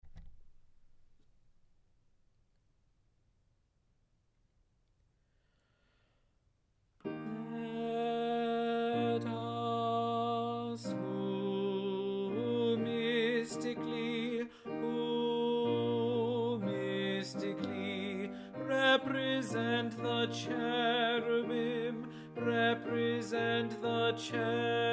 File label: Tenor; Cherubic Hymn, Anon.